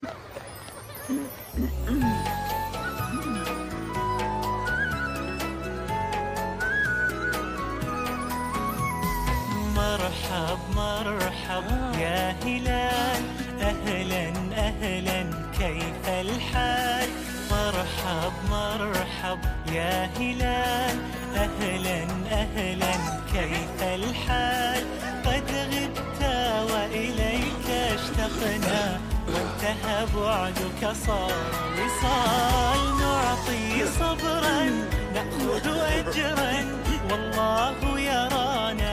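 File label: happy Arabic music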